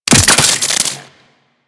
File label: Head Shot Metal, Bullet Impacts; Warframe; Weapons